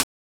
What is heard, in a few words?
Closed Hat, Genesis